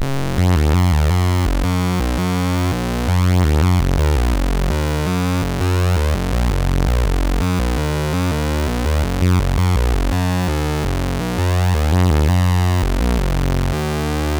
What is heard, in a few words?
BASIC, MOS6581R3